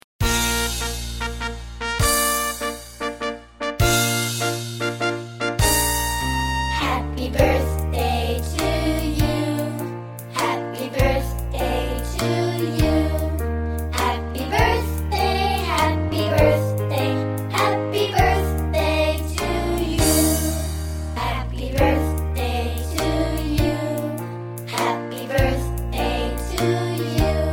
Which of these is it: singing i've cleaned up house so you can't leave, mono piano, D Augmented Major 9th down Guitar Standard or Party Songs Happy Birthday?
Party Songs Happy Birthday